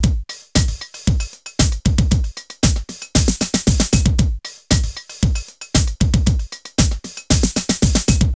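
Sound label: drum loops, right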